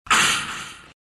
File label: pain; ancient apparition